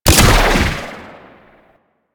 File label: Exalted Bow Fire C; Ranger